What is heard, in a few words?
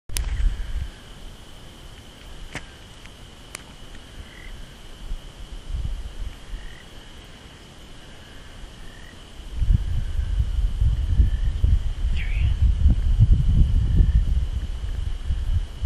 Eleutherodactylus marnockii